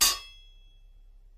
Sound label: metal hit